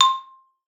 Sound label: C5, xylophone; Xsample VOL-4